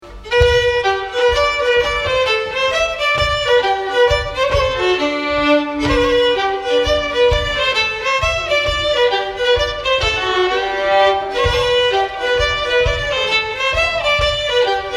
Vendelpolskan

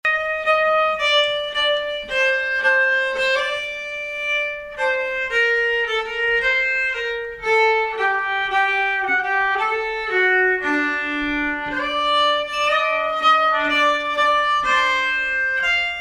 Brollopspolska sakta

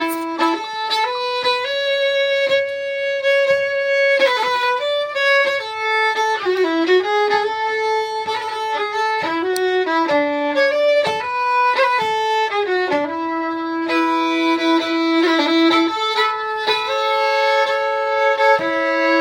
Akademimarsch

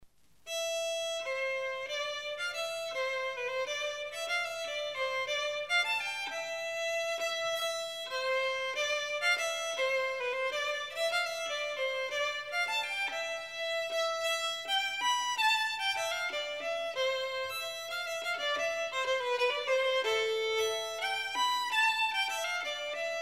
Polska

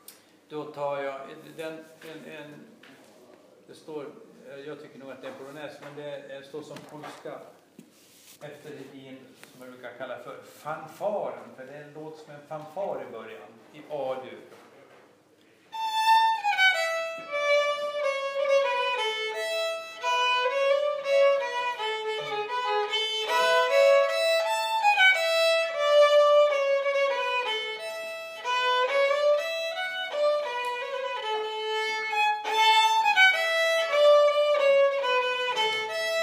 Fanfaren; Klockare Hedin

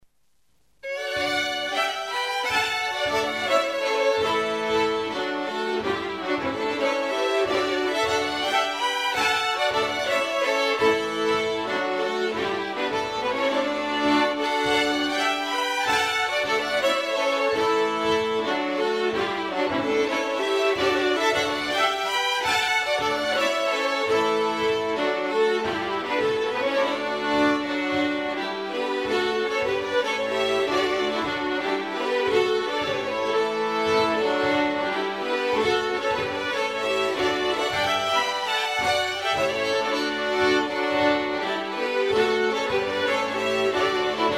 Polska

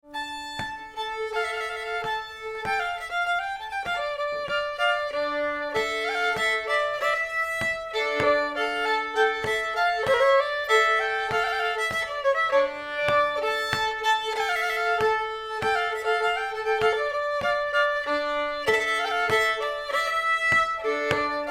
polskan; Farfarspolskan; Bingsjöpolskor

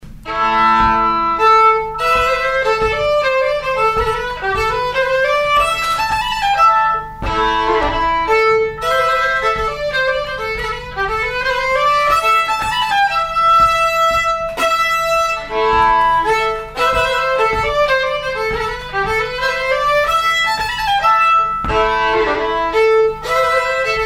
Polska; e Brand Jon

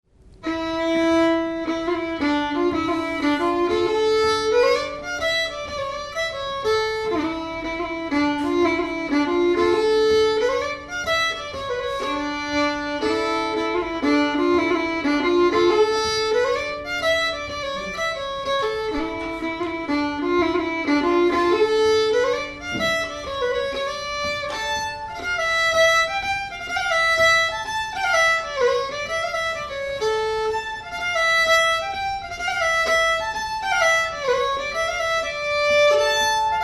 Polska

